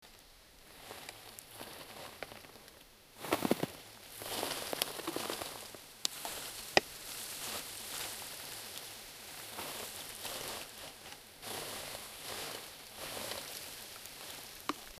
the sound of a baby, baby bear

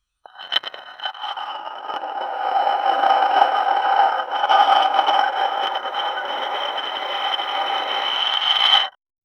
isolated single concrete, friction

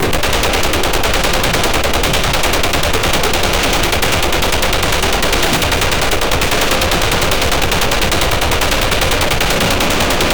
sounds of medieval war be, armor clank

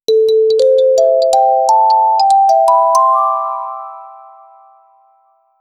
a short upbeat natural sound; teaching

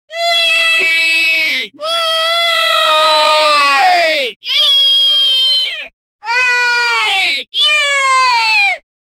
goblins yelling

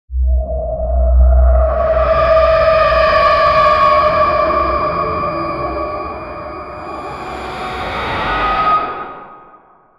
hollywood horror movies s

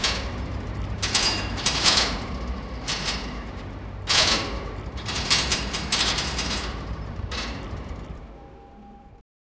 metal machinery, gear

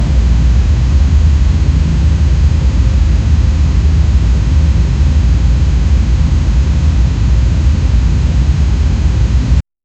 very faint ambient hum

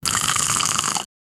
short sound effect of boiling

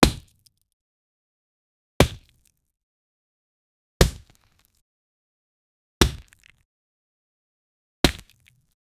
a series of short blows

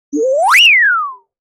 quick video game jumping

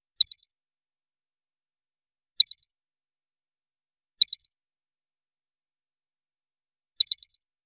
soft dreamy sci fi button

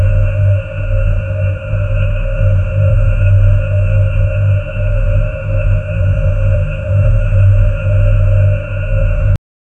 interdimensional portal

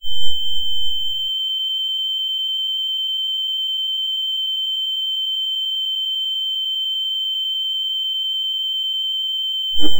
a single high pitched tin, empty space

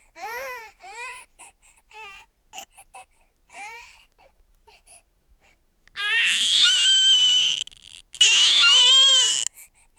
inside the cabin chaos, baby crying

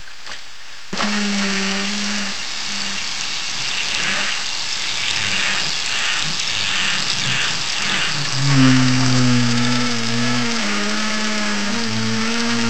wet dragging heavy mop, cleaning

